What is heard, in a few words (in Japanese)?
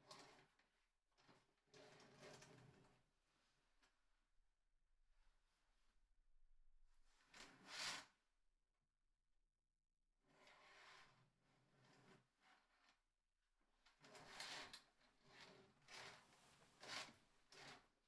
カーテン開閉; KU100; 色々な動作